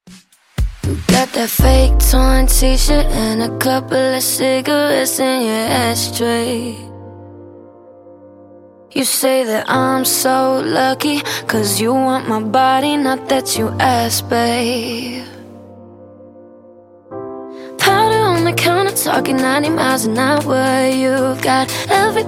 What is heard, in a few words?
Redrum 118bpm